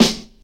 nice steel snare drum sample f key; snare-drum-samples

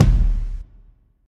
thumpy kick drum sample F key; kick drum samples

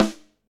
acoustic snare a key

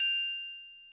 big room ride cymbal single hit f key

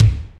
rich mid range bass drum single shot g sharp key; 1990s

